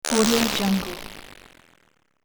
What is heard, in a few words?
old game dissolve